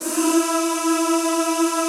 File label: ZZZ E3, Classical Choir; Best Service; Extended Classical Choir